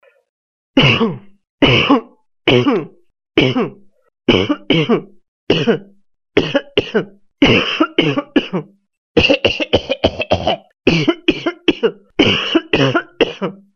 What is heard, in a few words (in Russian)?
zvuk kashlja